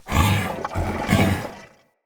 Corrupted Battle Dog Attack Breathe Snarl Active Walk, Animals, Vocals